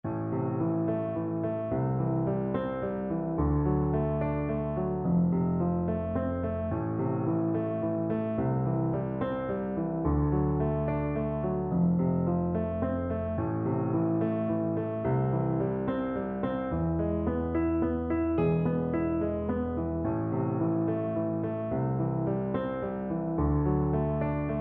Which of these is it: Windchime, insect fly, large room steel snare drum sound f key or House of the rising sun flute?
House of the rising sun flute